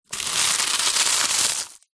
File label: food crispbread squash crush on wood